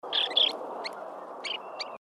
SANDPIPER BAIRD S; species/birds; wbba

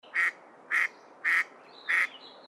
MALLARD; species/birds; wbba

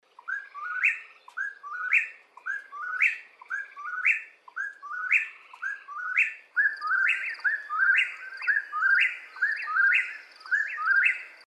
WHIP POOR WILL, birds; species/birds; wbba

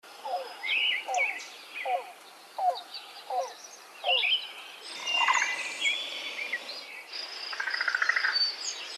cuckoo yellow billed; species/birds; wbba

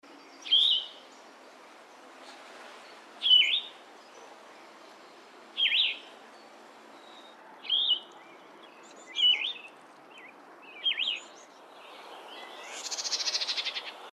VIREO YELLOW THROATED, birds; species/birds; wbba

